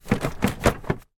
toy plastic workbench wobble shake rythmic

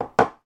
household cup mug place on hard surface; sound effects one

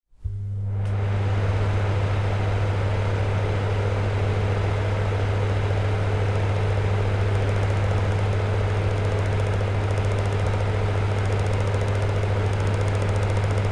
household bathroom extractor fan on operate off

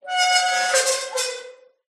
industrial gate farm metal swing squeak

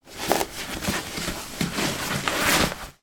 foley boot work put on; sound effects one

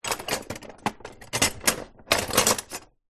household large metal cutlery movement in drawer; zapsplat

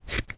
cutting hair scissors single cut; foley; zapsplat

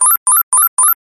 science fiction alarm loop

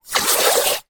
compressed air spray into water bubbles; industrial

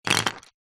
office pens pencils handful tap tips on table

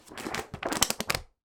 household telephone directory pages flick through

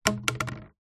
card tube drop wood floor

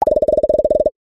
cartoon bubble pop multiple fast

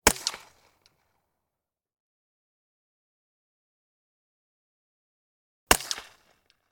weapon gun walther ppq; still north media

